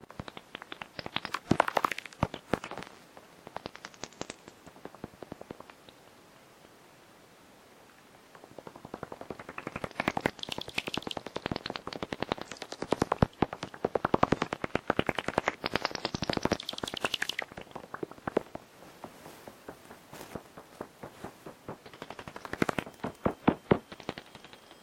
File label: Batscanner